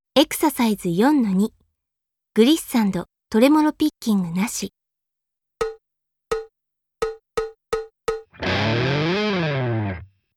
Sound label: Mellow Glissando (Tremolo Picking); K-ON